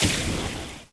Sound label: hot burst; ambient; Shadow Man